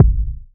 nice bass drum single hit a sharp key, bass drum sample tuned to the A# note